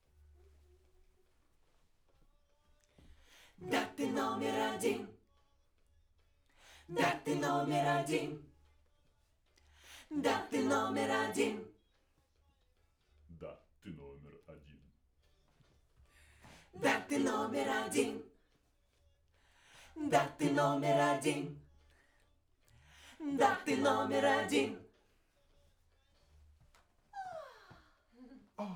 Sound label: Backs SCHOEPS